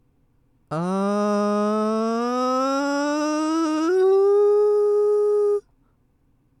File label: glissando mix